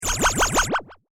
Strong Laser Pulse, laser gun sound effect; Royalty free science fiction sound effect; star wars / trek style games

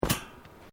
Footsteps Boots Grill Metal Step; Footstep Foley; Footsteps (Sound Effects:Human)

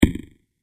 Deep Wooden Thud Sound; Wood Sound Effects; impact sound effect

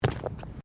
Footsteps Boots Plastic Ground Mat Step

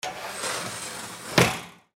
Window Slide Shut Close; Household

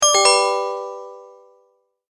multimedia tone, Goodbye; Tones; Sound Design; McKinney Sound

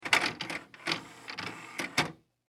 Technology Dvd Player Change Disc; Entertainment System Sound Effects

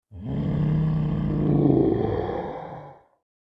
Beware Of The Yeti, monster sound effect; horror sound effect

